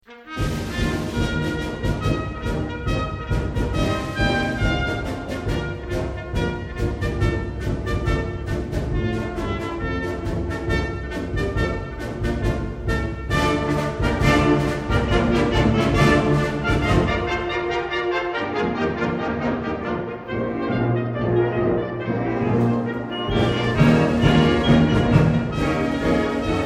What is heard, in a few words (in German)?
Solo Trompete